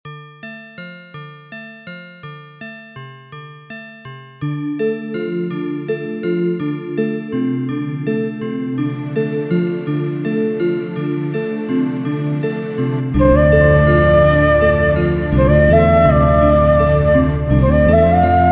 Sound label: karthikeya bgm flute